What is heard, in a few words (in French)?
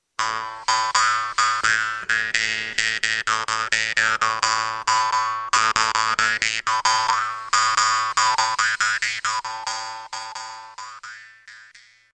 guimbarde; instruments